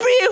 Voice Crack

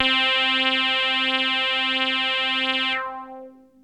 Techno leads, hits and riffs

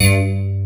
BUG BASS; Pro-Rec - Synthsonic; BASSES

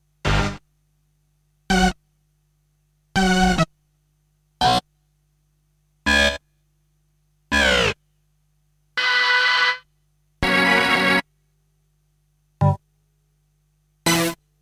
Stabs; Kniteforce; 1000 Samples Volume 1

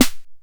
Snare, retro house samples, Drum Hits

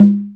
CONGA LOW; Greytsounds; Scott Peers Sound Engineering

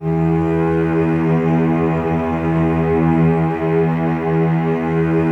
cellos E 2, String Ensembles; Miroslav Vitous; 90 s Sample CDs

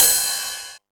909 Ride; Novation DrumstationV1

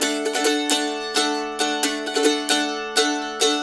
132 D, Blues Guitar RIFF

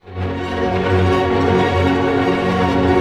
ARP F# MJ PP, STR ARP MAJ; Sound On Sound - Peter Siedleczek's Orchestra

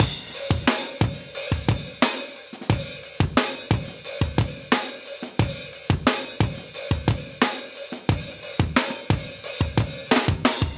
89 Basic Dope, Live Breaks

